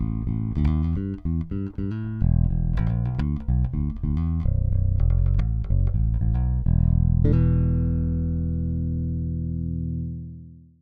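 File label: Split Jazz Active Middle, 5-String Pickup; Fralin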